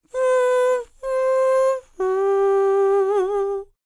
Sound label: HUMAN TRPT, Jazz FX; Spectrasonics; Vocal Planet